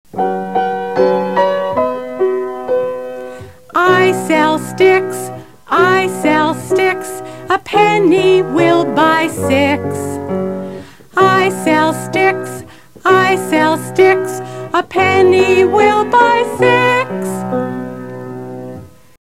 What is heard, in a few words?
Stick Peddlers Song Vocal; Three Piggy Opera